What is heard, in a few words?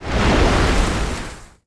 eff skill